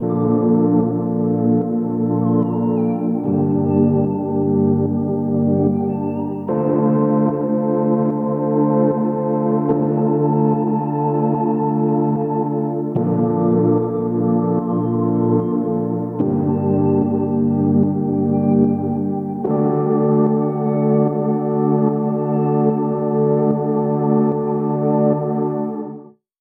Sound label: Lofi Synths; WA Glow